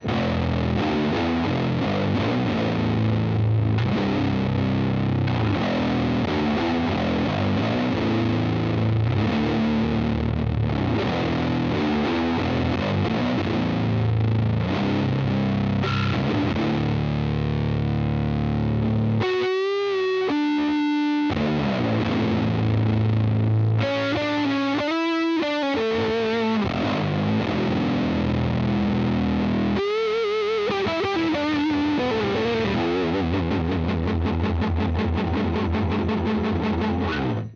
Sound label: Sat, guitares